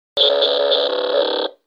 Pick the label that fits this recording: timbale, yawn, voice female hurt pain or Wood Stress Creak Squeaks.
yawn